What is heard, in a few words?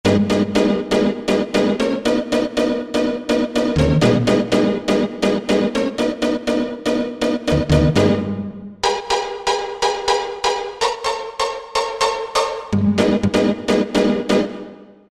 Techno Pizz; Roland SR-JV80-11 Techno Collection